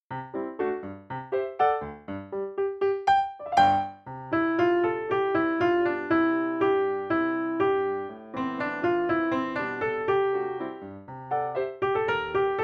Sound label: Melody; Belle